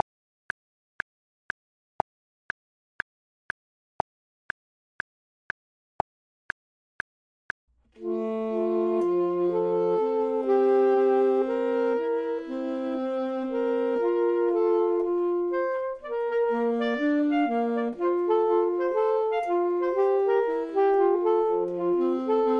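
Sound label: both, Saxophone and Clarinet; voxman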